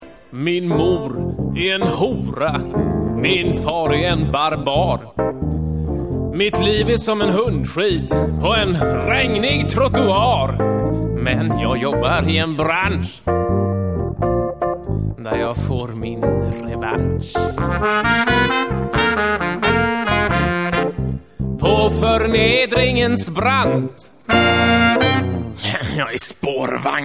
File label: antiblues